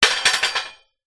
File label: impact metal drain stone roll